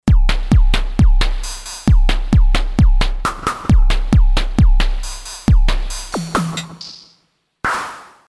Artiphon ORBA 2 Boomy Booms Drums

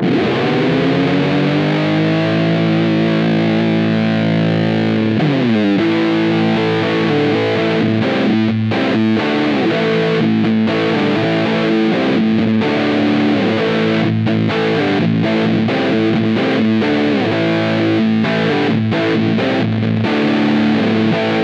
Rams Head Breakup Amp; J Mascis; Sustain 3 Uhr

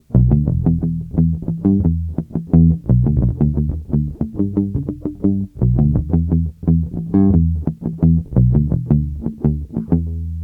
Block 300B EQ Hicut DI

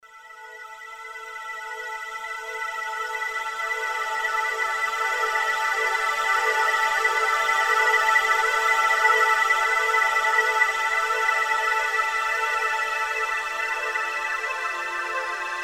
Hydrasynth flyover

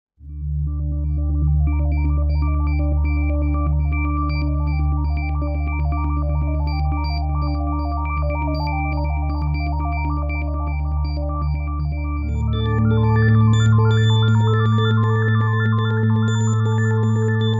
Deepspace; Fireball

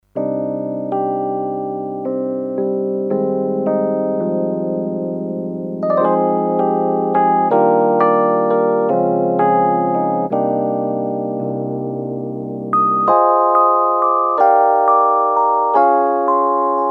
Casio PX-S7000 Taxi EP

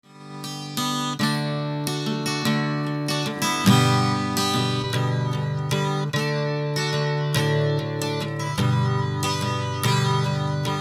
Guitar Vibrato normal; Boss CE-1

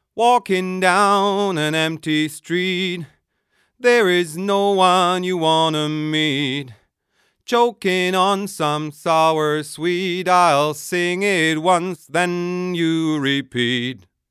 Shelford Channel Vocal neutral EQ; Rupert Neve Designs

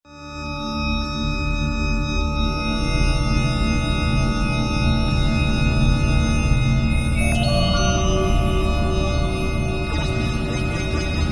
Space Pad

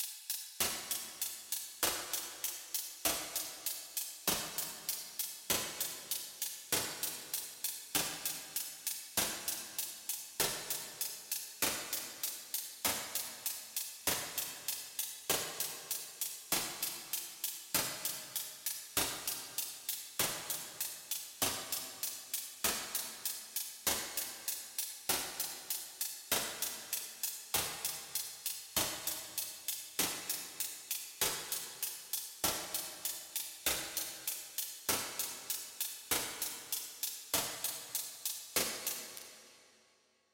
Eventide Stick SP2016 Stereo Vintage

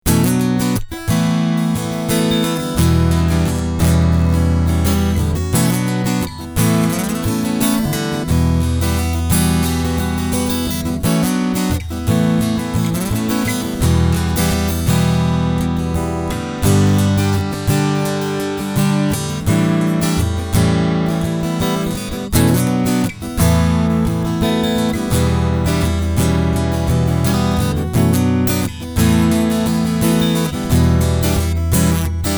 Strumming Treble 12 Bass 9 Uhr

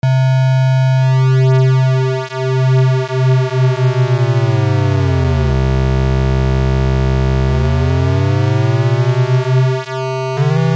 Korg EA-1 Osc2 Range

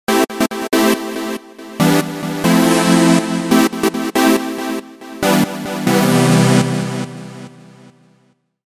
PWM

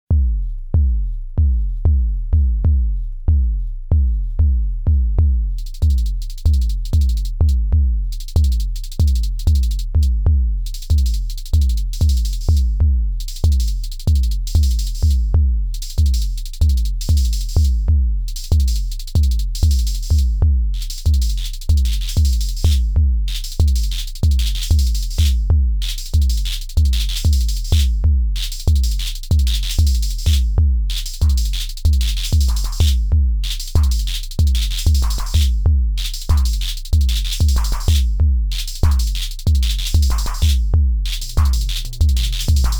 Synth ADS 7 Pattern

